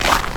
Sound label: frosted snow hit; everness